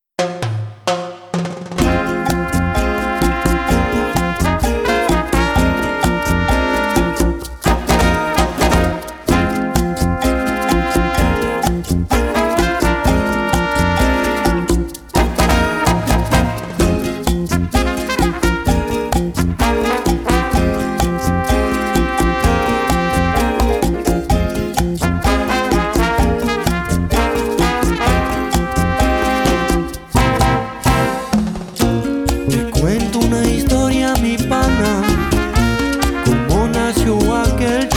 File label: Rumba Mambo Cha Cha Cha